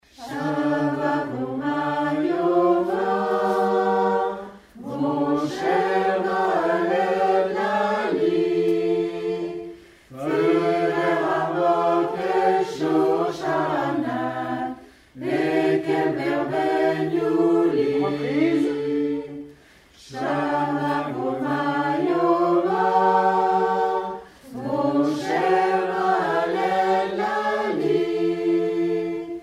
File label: Erev couplet tutti